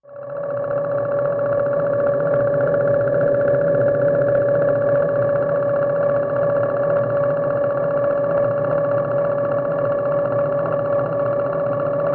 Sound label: Propane Pump Machine H; sci fi ambience